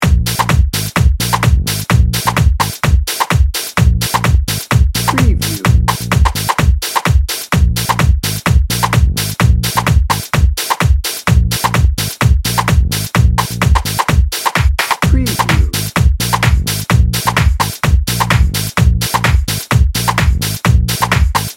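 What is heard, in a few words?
funky fantastic drum and bass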